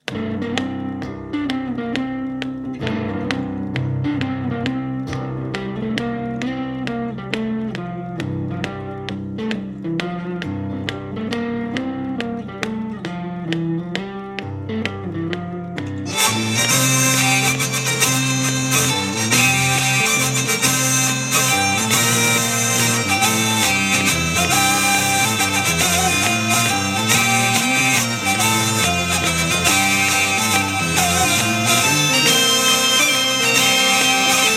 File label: mazurka